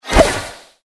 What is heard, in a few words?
Carrot explosion